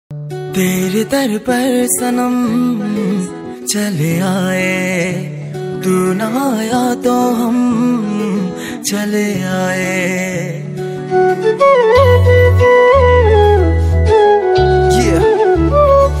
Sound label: Ringtone